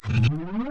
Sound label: synth worble up; elementary; themes